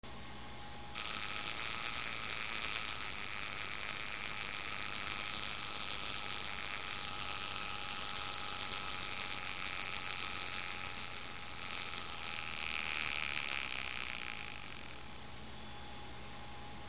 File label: ling ling ling, russian watches